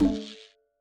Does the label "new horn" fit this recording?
No